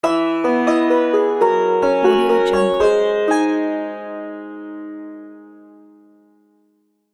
positive sitar transition

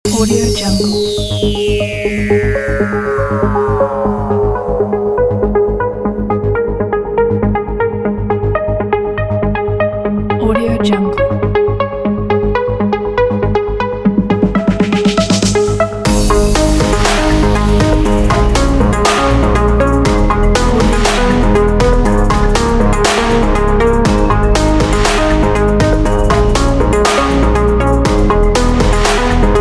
techno trap

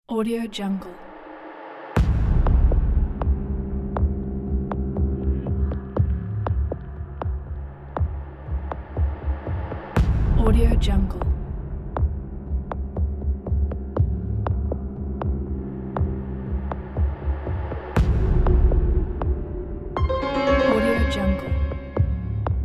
horror ambient

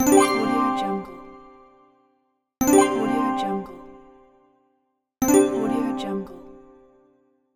correct

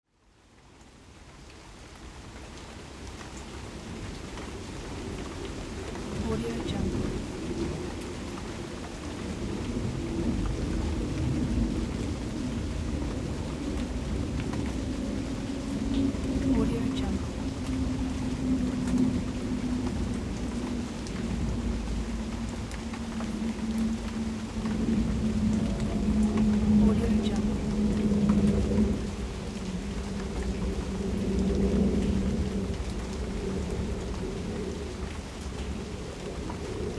eerie distant vehicles in heavy rain

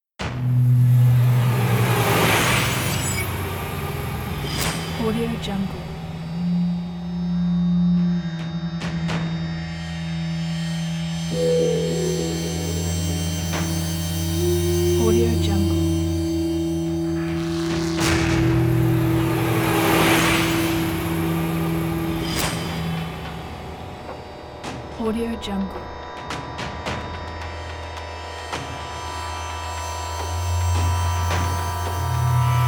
minute of tension

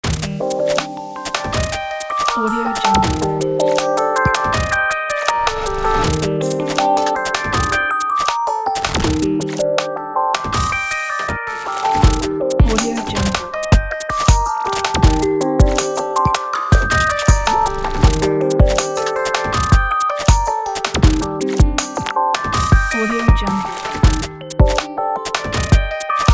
glitch beat

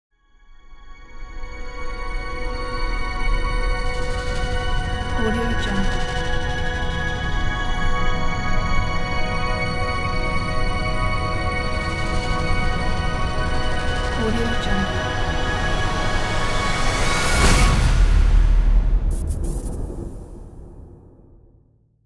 rising logo

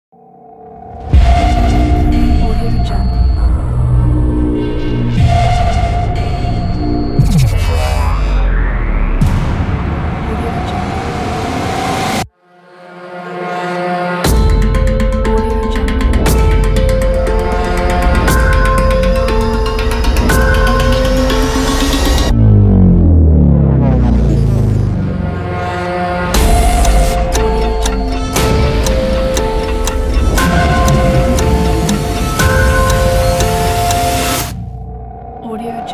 aggressive intro; nra-lab

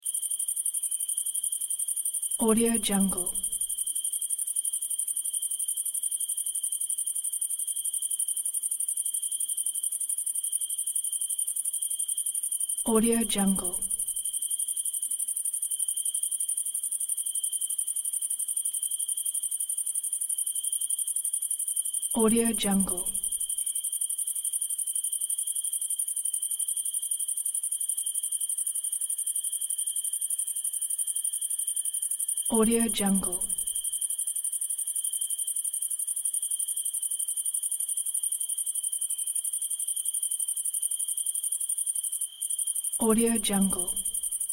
calm quiet summer night

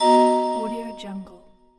game bonus chime; drtimwaters

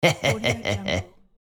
male malicious laugh

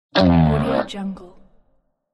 game character creature voice demon scream

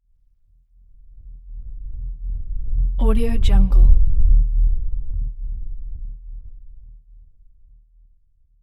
energy pass bys

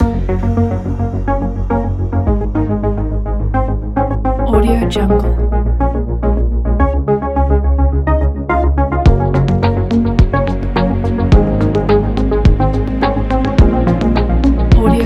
technology uplifting

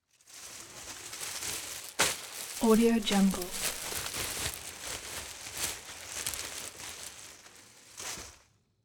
garbage bag handle; collanderson

